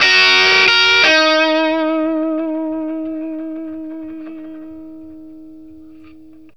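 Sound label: BLUESY E flat 60, Vintage Blues Guitar; USB Soundscan vol.22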